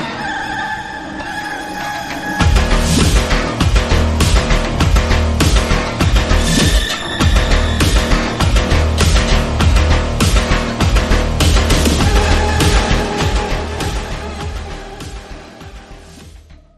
phonk; darktide

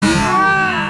haaaaaaaa

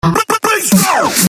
bassdrop

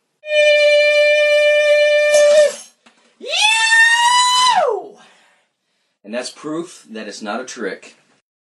break glass

